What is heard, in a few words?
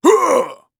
warrior attack swing